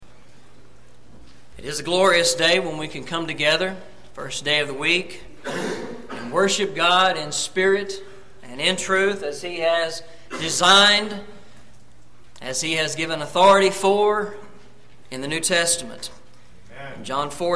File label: Worship Singing Without Instrumental Music